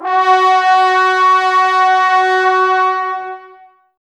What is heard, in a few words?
BRS TBS F#, Woodwind Brass Ensemble, Brass; Miroslav Vitous - Woodwind Brass Ensemble